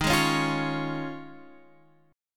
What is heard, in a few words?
D sharp 9th Suspended 4th D sharp, Guitar Half Step Down